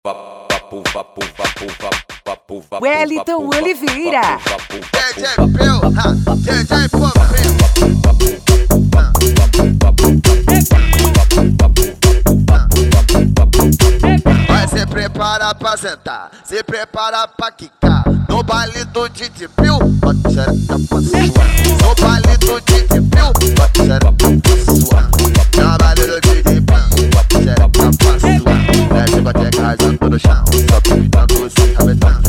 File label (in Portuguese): funk tumdum e mega funk